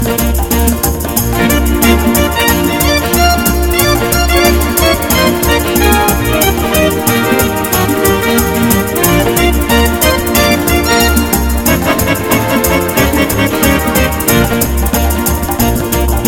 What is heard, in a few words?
Fast Ticking